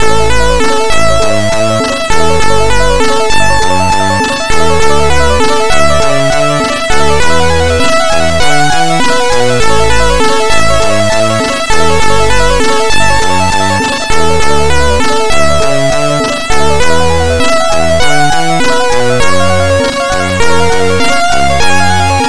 c64 hiscore; delta

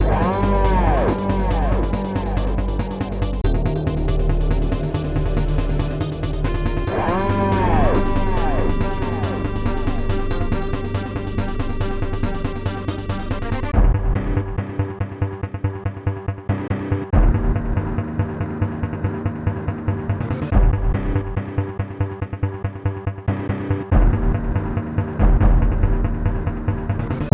snes big boss blues; donkey kong country 3

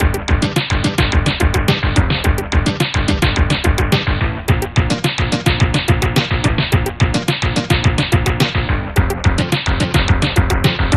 loader; kefrens

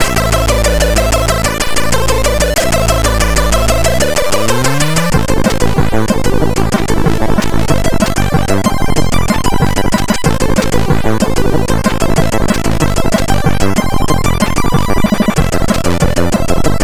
c64; turrican; going down

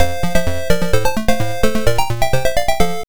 msx game clear; goonies

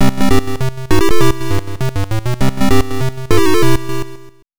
arcade story; arkanoid

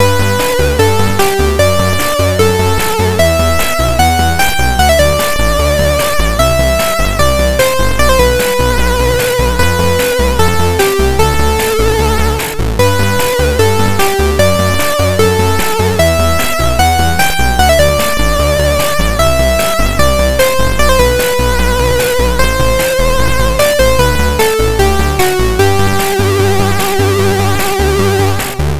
c64 hiscore; paperboy

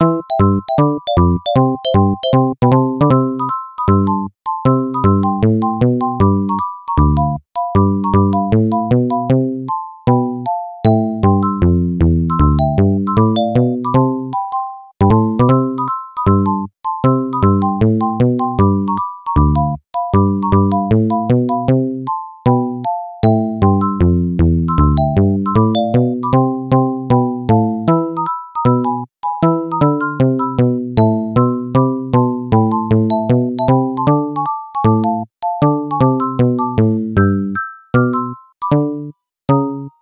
arcade background music; bomb jack

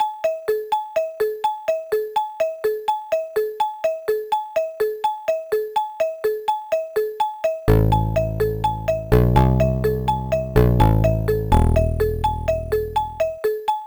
amiga in game; chambers of shaolin

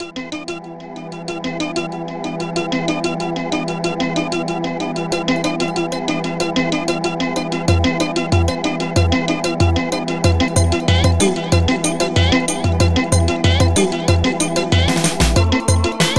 loading; budbrain

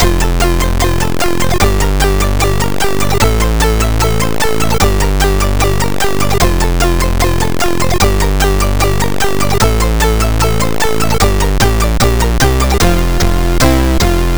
c64 in game; r type

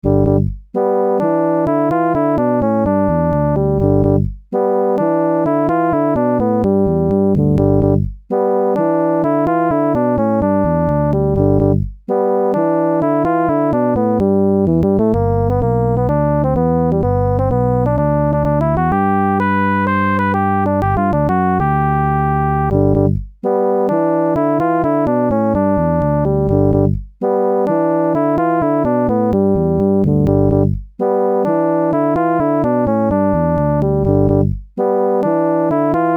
arcade main; elevator action

